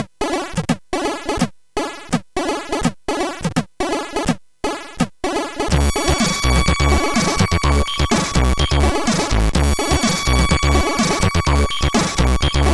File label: Haunted Muzak, sid CSG8580R5